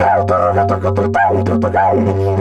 G 100, DIDGE 100BPM, Didgeridoo

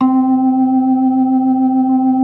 B3 C 5; Best Service; Advanced Media Trax Modern Composer; B3 LES 1

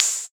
Open hat, retro house samples, Drum Hits

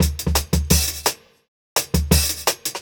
Jungle Breaks

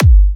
G, kicks; markus hakala kicks

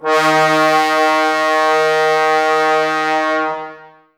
Brass, Woodwind Brass Ensemble

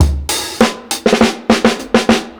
60'S STYLE 100 FILL, DRUMS LOOPS; BASEMENT DRUMS VOL 2

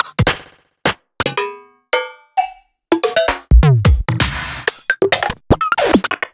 Percussions; Sliced

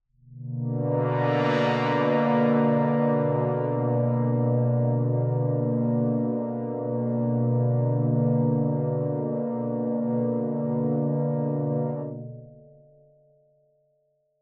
One Shot Drone B, dystopian drone; One-Shot Drones

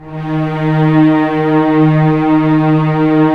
orchestral strings f slow; Roland LCDP13

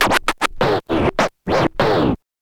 scratch kit, vinyl

